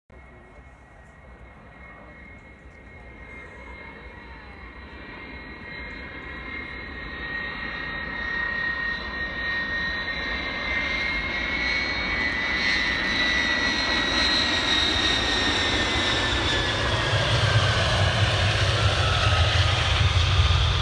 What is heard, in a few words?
Tupolev Tu-154; توپولوف ۱۵۴